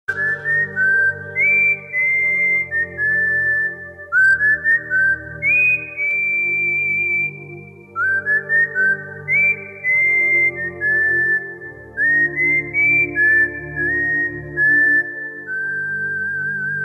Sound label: iphone 4s whistle